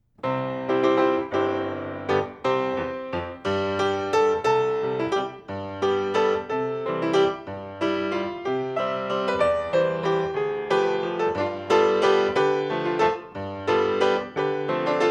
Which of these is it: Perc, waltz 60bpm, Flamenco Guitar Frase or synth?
waltz 60bpm